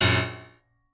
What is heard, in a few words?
piano